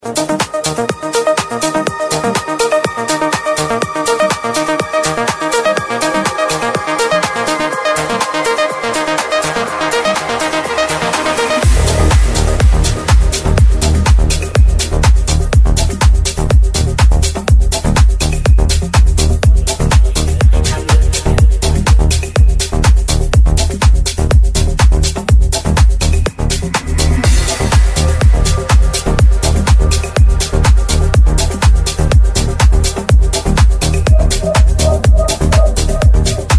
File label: progressive melodic house